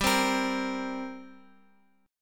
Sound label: G Minor Double Flat 5th, G, Guitar, Open F; strummed; JGuitar